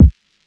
kick; eddyflux; crate bd; dough-samples

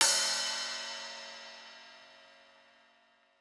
ride; eddyflux

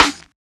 snare; dough-samples; eddyflux

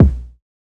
kick; eddyflux; crate bd; dough-samples

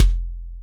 808 K, BossDR660 bd; drum machine

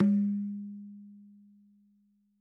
dhum, mrid

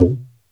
gumki, mrid

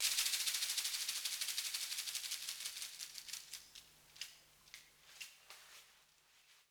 shaker; dough-samples; eddyflux

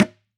dhi, mrid

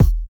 HH BD, MPC1000; drum machine

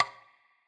stick; eddyflux

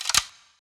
perc; eddyflux

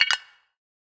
perc; eddyflux

